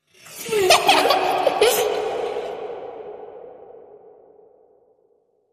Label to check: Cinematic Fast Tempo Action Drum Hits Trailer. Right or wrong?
Wrong